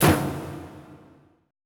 ETH DRUM

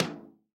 Tom, Old Skool House, 120bpm; Big Fish Audio; Construction Kits; House of Amnesia

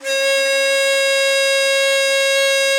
BON DI, Winds, Old World Instruments

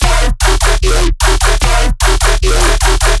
bass loop straightforward D# 150; Virtual Riot

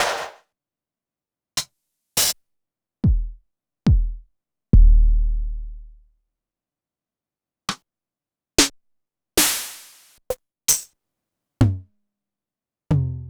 Concrete 999

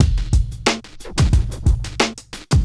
Street Tip, R&B Drums

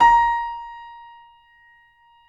BB4, YC7 Piano mf

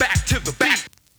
Scratch

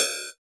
Cymbal, Genesis; ToeJam & Earl 2

